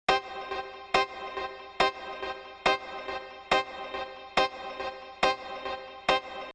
piano

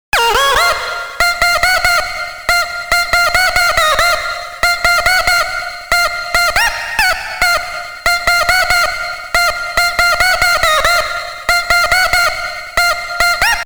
with reverb vocal

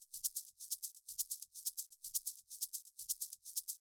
Shaker before

